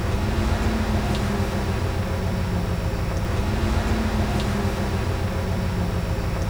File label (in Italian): accensione; Aln501; OpenBVE; Train